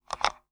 Taxi Meter

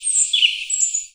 Birdsong Bit, Oxford Island Lough Neagh

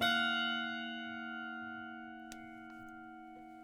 Prepared Piano

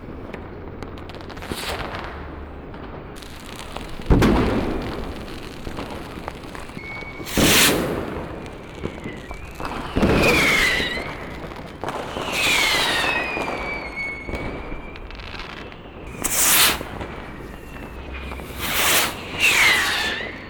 Fireworks Short

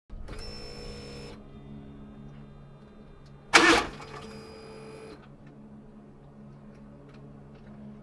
Car Ignition

